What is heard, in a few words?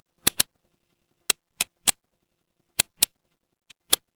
mechanical switch